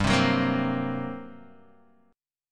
G Minor Major 7th Double Flat 5th, Guitar, Standard; strummed; 3,3,4,3,1,x